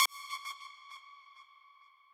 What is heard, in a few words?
lead